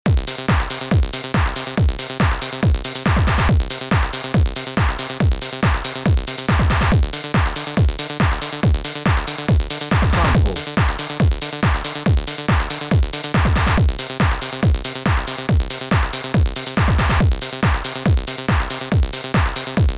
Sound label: techno, backing